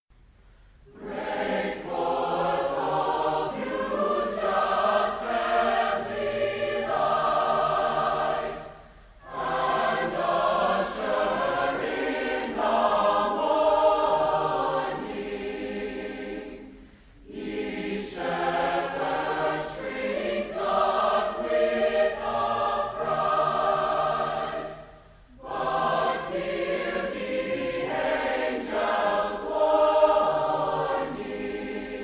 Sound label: choir; advent music; breakforth